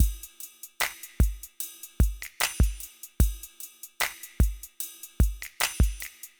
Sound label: drum loop